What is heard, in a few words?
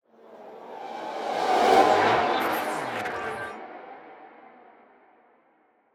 Slide